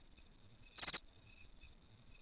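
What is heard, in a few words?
cricket; Microsoft Graphics Studio; Home Publishing 99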